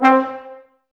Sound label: Brass, Woodwind Brass Ensemble